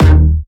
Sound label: Bass Shot D#; Mutekki Media; Vengeance Electroshock Vol.2